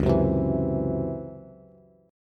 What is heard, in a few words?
C sharp Minor Major 7th Double Flat 5th, Bass